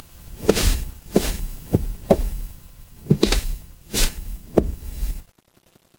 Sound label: footsteps wood